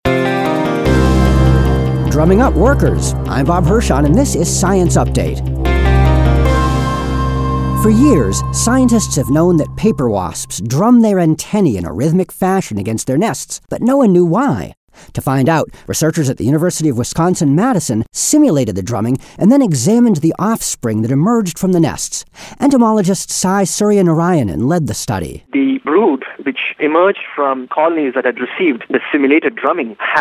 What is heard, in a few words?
drum